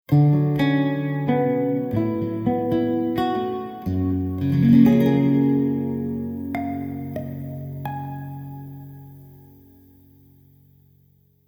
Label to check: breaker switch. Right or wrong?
Wrong